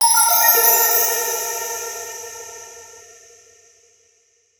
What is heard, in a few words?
SOUL PLACED